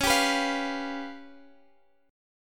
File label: C sharp 7th Flat 5th; strummed; x,x,11,12,12,13